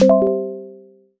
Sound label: Exclamation Warning; Emberfall